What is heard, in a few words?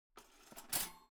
Kitchen toaster pull in; phong bep